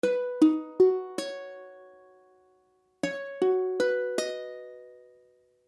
Hadd9, akordy ukulele; H akordy (=B akordy)

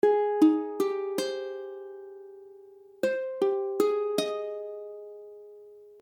Abmaj7, akordy ukulele

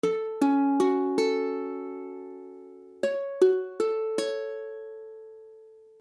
Fism, akordy ukulele; F#m

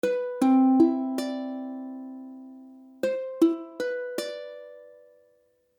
Cmaj9, ukulele; ukulele akordy

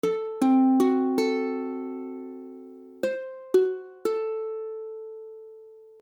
Fisdim, akordy ukulele; F#dim; F# akordy (=Gb akordy)

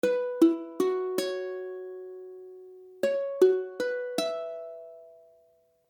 Fis7sus4, akordy ukulele; F#7sus4; F# akordy (=Gb akordy)

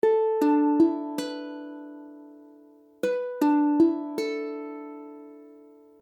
E7sus4, ukulele; ukulele akordy

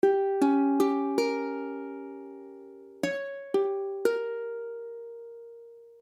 Gdim, ukulele; ukulele akordy

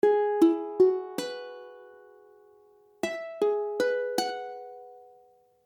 Eadd9, akordy ukulele

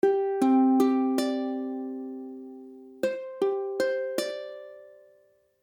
Csus2, ukulele; ukulele akordy

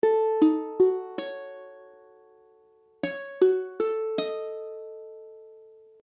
Ebm7b5, akordy ukulele

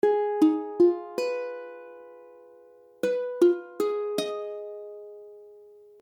Abm6, akordy ukulele

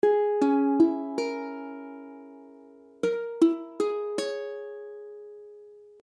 Bbm7b5, ukulele

